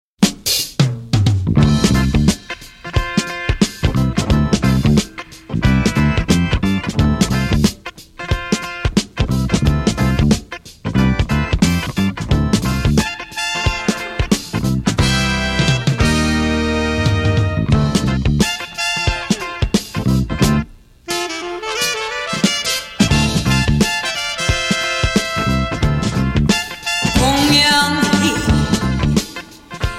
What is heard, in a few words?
funky Korean soul